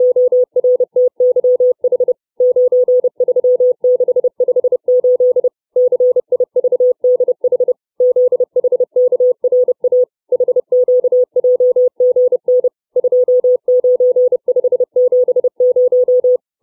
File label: letter number groups, CW